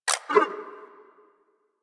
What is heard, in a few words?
Briefcase Akimbo Pistol Mech; Warframe; Weapons; Corpus